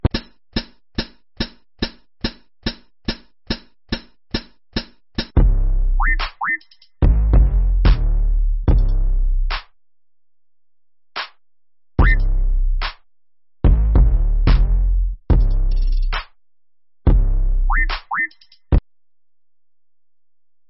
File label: foot drums